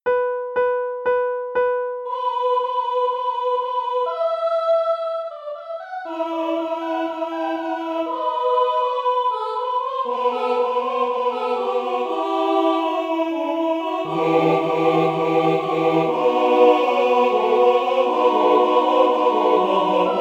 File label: Bass; Vivaldi Gloria; Propter magnam